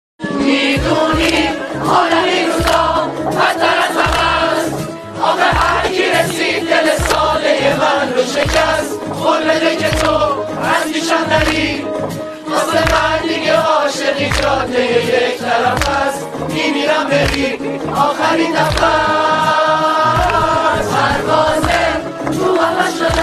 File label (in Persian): Ba Gitar